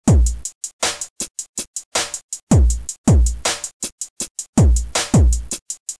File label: loop